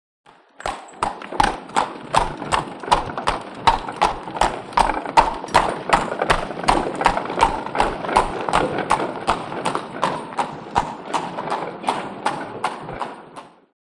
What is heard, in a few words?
By Carriage Pair Up Pa